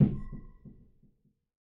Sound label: Sub Tube Hit; MiSide